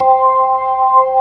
MID SLOW C4, ToneWheel Organ; E-MU Formula 4000 Series Vol. 3; Analog Odyssey

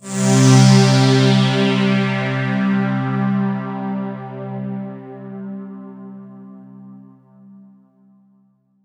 C2, Roland JV 2080; MG INTERVAL

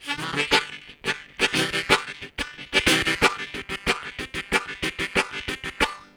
Driving Bass, Harmonica; Zero G

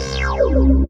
Short Reso Sweep C 120; Trance Formation

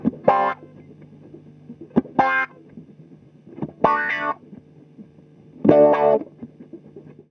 GTR, Roots Disco; USB Soundscan vol.32; AKAI

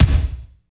KICK, Tekno Industrial; East West

